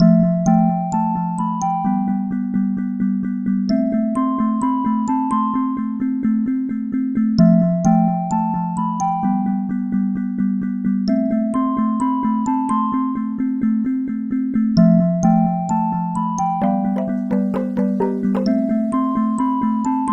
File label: Ring Tribal